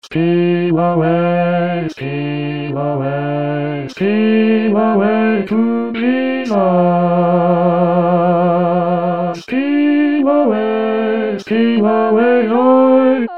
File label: bass; Steal away